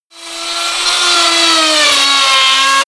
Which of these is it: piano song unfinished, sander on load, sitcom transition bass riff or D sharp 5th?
sander on load